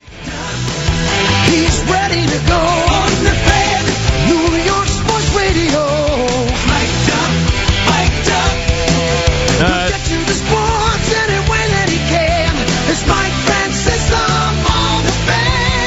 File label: Screws Up JINGLE; WFAN